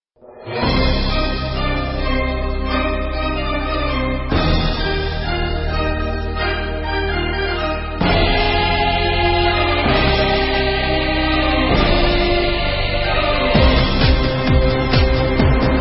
Mandolina

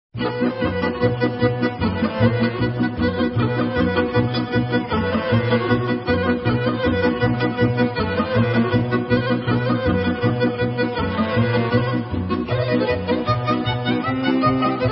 Serbian

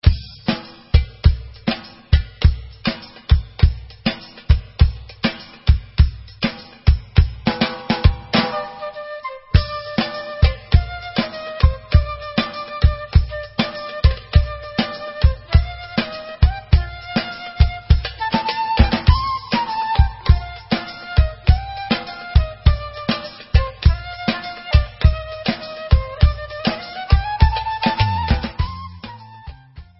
Debka Instrumental

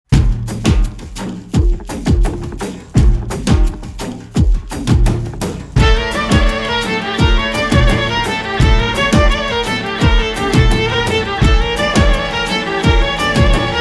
instrumental

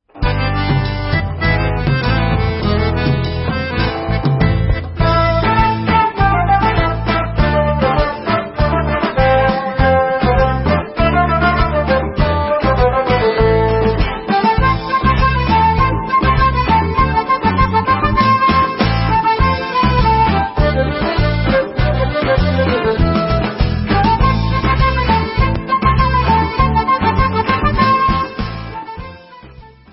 Debka Instrumental

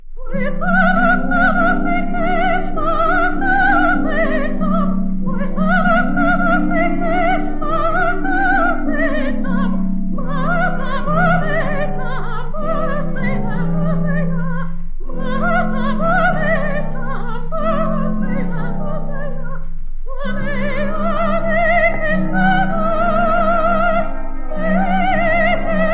folk

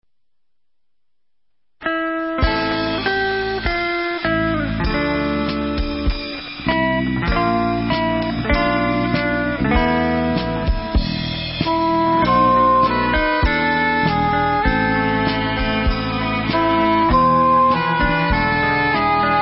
Instrumental

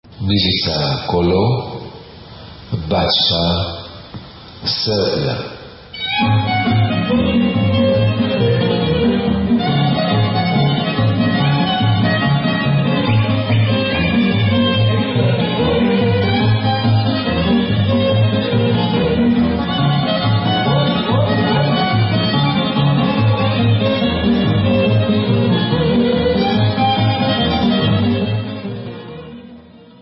Kolo Serbian Instrumental

